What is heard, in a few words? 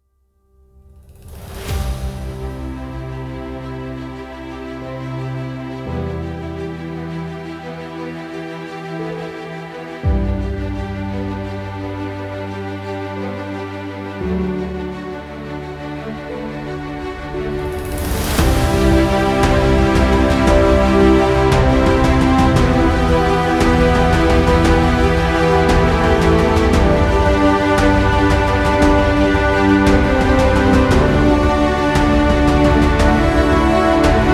Epic Inspired